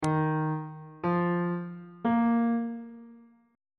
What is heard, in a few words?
d sharp suspended 2nd triad chord intervals on bass clef